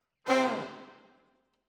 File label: Trombones, jazz for piano